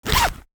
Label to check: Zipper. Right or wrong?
Right